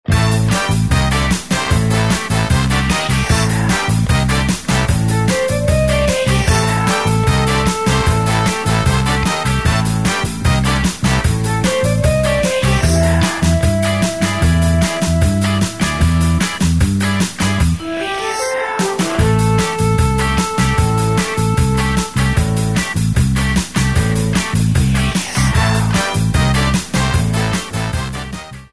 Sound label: Cocktail - 151BPM, lounge swing